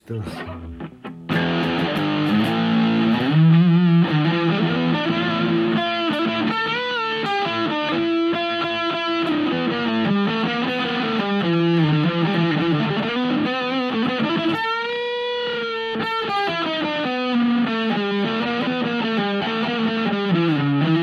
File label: Boss DS-1 Distortion